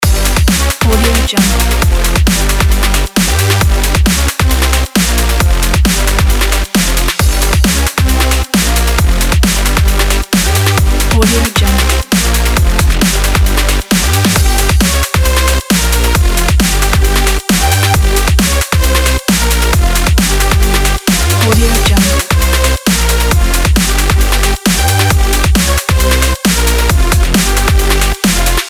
action breaks extreme

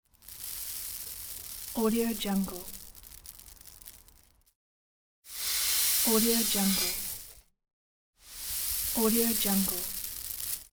grain noise

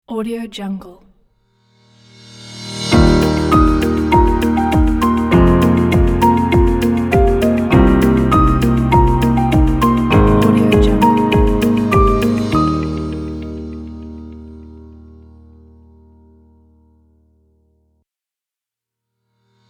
brand corporate logo pack

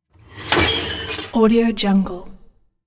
creak oven door open

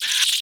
insect creature scream chitter giant insect

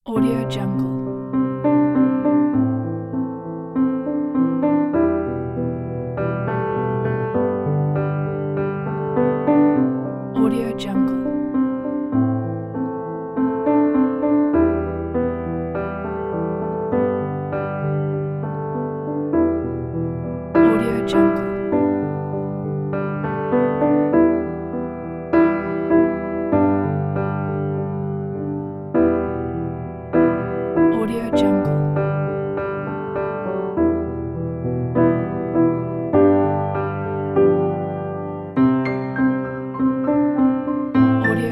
placid sentimental ambient

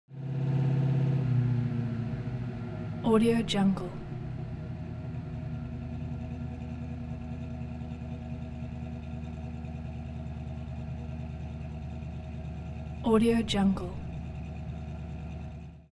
transportation car camaro slow down exhaust

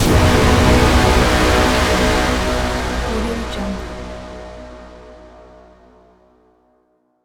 braaam strike; esmteam

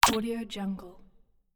ui accept; bogdansfx

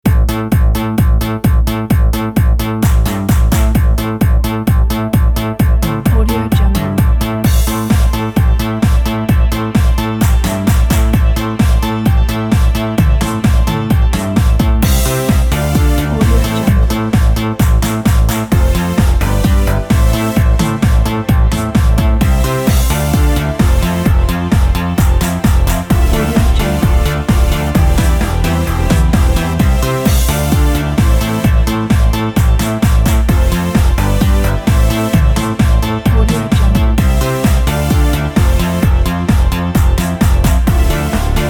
80s vhs hero

